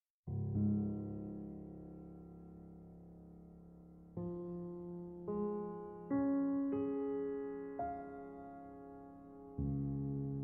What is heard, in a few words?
Nocturne D-flat major